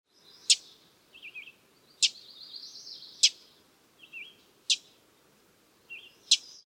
Yellowthroat Common Chip

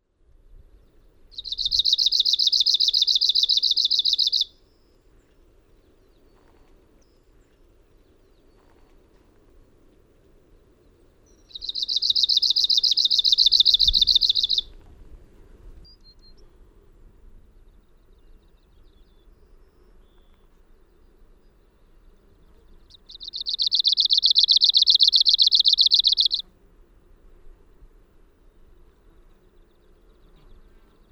Warbler Arctic

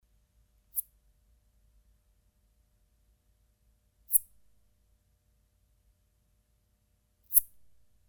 Katydid Fork Tailed Bush

